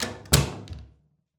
Door Bathroom Close

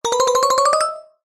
cartoon footsteps playful fast ascend

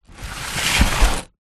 cardboard box large slide garage floor; foley; zapsplat

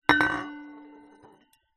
household flask metal down on table and scrape

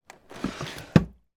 household cabinet drawer close; zapsplat

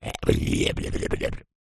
horror goblin vocalisation funny; zapsplat

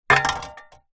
industrial shovel metal set down concrete ground

